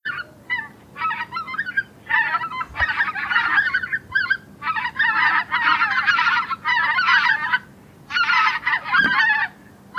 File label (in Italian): Oca lombardella